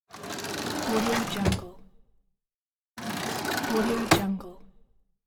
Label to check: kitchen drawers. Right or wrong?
Right